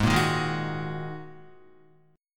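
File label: A flat 7th Suspended 2nd A flat Guitar, chord; Ab7sus2; 4,1,1,1,3,1; strummed; 4E,4A,5D,5G,5C,6F; chordshape; JGuitar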